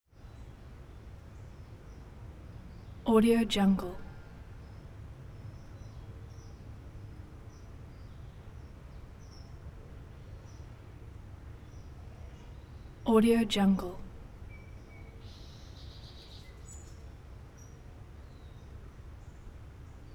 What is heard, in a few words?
laurel canyon neighborhood